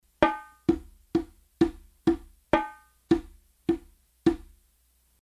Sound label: example rhythm metric